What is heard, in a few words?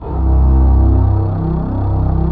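string section, orchestral strings; Greytsounds VOL-1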